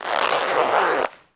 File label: ZIPPER